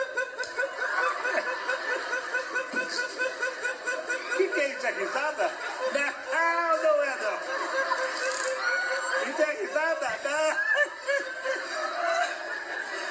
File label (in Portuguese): risada